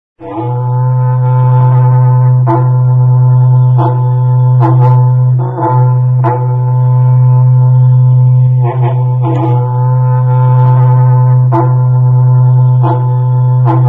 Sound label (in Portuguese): Berrante; Vinhetas